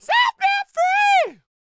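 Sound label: falsetto, R&B Dance; Spectrasonics Vocal Planet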